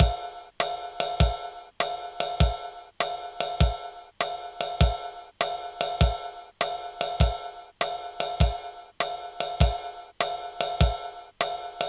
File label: drumpat swing; Music-X